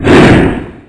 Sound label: attack; morocc